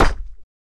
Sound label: book; Heaven Studio Plus; cheer Readers; book Player